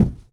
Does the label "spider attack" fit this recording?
No